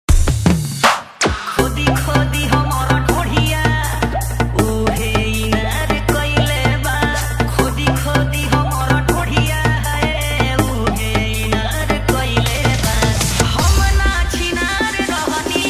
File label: Bhojpuri Dj Remix Songs Edm Trance Mix